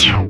cancel; Drome

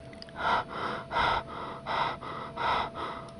panting; Nokama

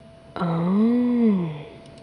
ohhh; Nokama

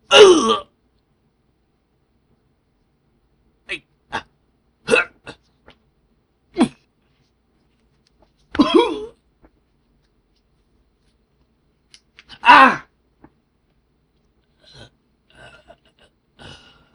grunts and groans

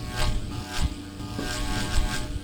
spinning; rhotuka

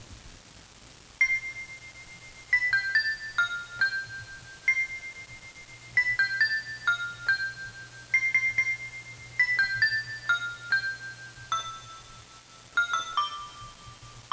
bionicle music

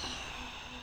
sigh

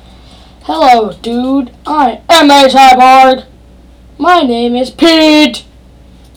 duuuh

